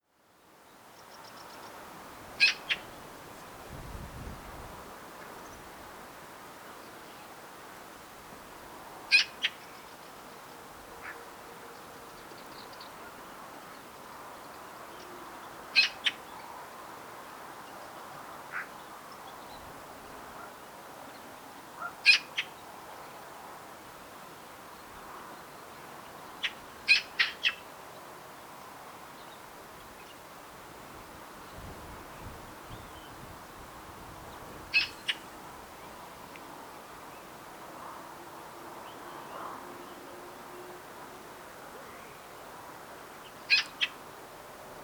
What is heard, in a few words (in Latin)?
Pica pica; SETE